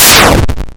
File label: beam; moondef